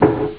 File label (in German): klopfen; Amiga Soundtracker sample